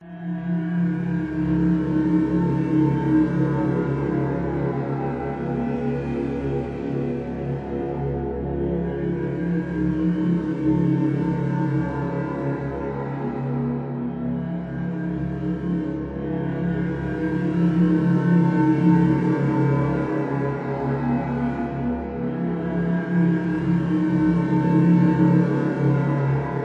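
science fiction atmosphere menacing growling drone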